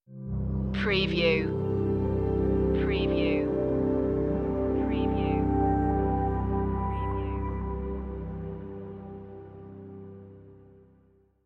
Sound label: minimalist stinger